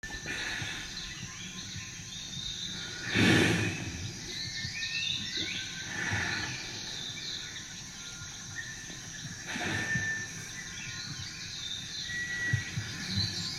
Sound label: Soundscape